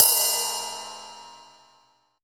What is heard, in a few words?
RIDE, MONO RIDE CYMBAL